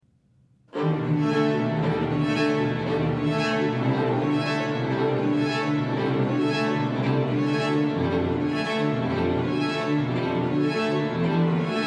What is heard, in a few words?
squeezebox reel; the ewes reel